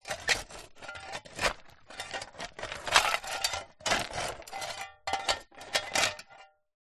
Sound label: industrial shovel metal dig movements dirt large stones